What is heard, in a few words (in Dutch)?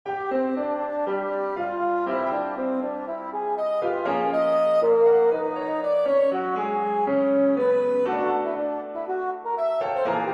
Saxofoon en Piano; 3 Melodieën voor Saxofoon en Piano III. Allegro e dinamica ad libitum